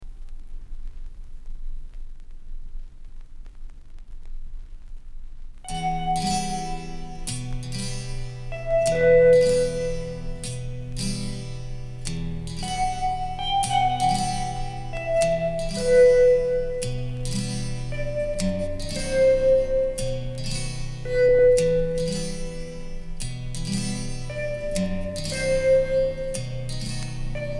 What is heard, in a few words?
romantic waltz